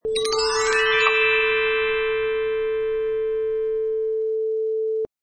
Xylophone Ascend

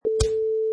Face Punch

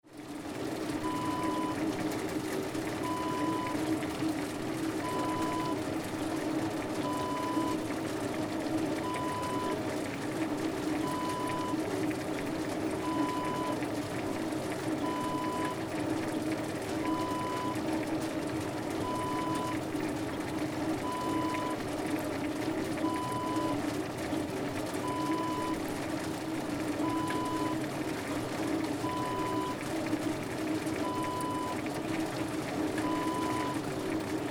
platter lift

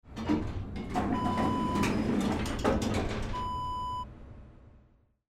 elevator door open

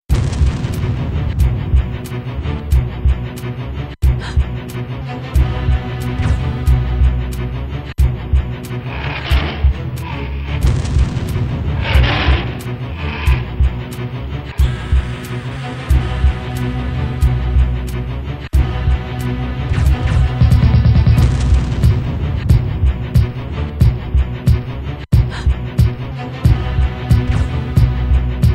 beat

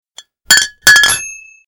Beer Bottle Clank

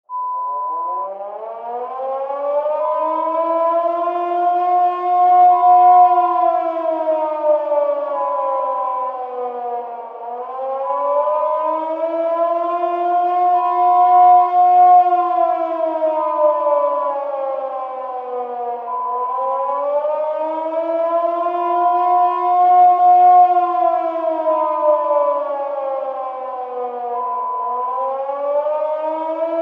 Air Raid Siren

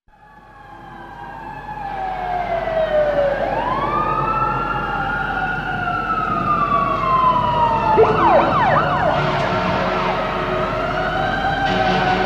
Fire Engine New York

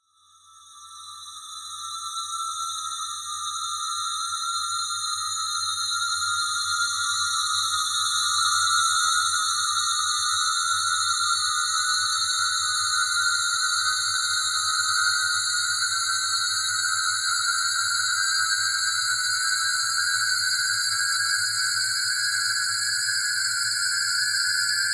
Sci-Fi SFX

